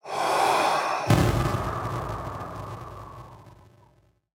Sfx - Carregar E Atirar; Neon Souls; PS4 PS5 switch windows xbox one xbox series xs

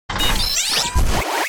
Ativacao

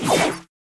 Tiro gordo

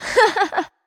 Riso neutro

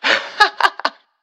Riso neutro

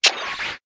Ligando

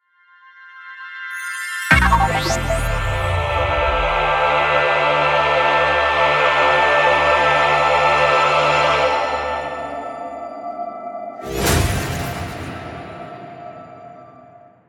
Sfx Luz E Explosao; Neon Souls; PS4 PS5 switch windows xbox one xbox series xs

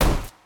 inimigo hit; vrido

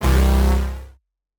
Sfx Laser Boss Ataque; Neon Souls; PS4 PS5 switch windows xbox one xbox series xs

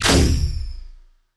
tiro sem arte

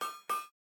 Aranha ataque

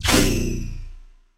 tiro sem arte

